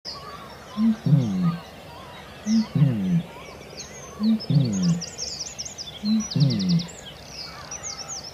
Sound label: Booming Bittern